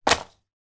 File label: game card pack throw down on playing board in frustration; monopoly